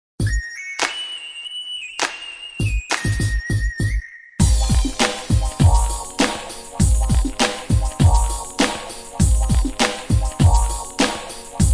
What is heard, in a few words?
Randb Rap Scratchy